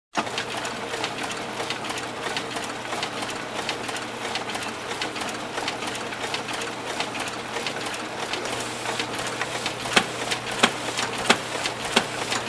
Heavy Duty Mixer Grinder Machine

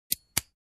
Closing Lock

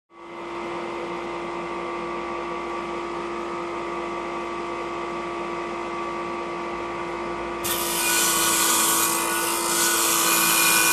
Ice Machine cutter

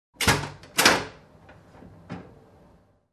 Opening Door Loud

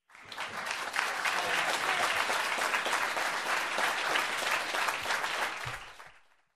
Group Agree Applause

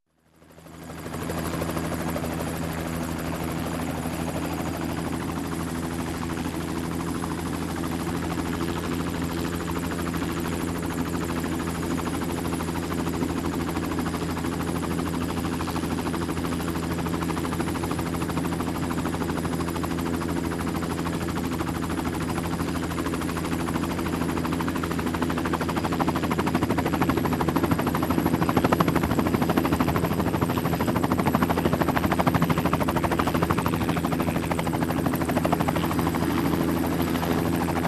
Helicopter taking off

